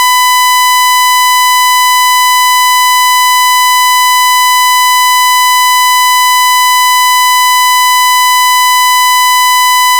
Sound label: sawtooth